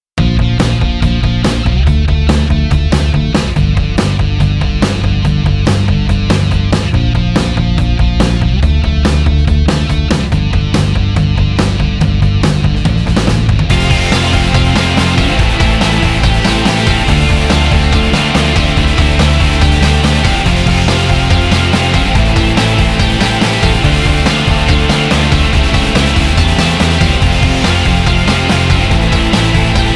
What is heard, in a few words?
Pop Rock